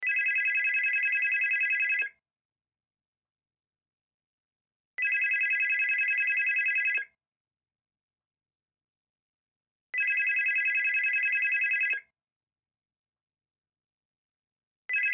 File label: Telephone Ring Loop; Office; Telephones; Electronics; Blastwave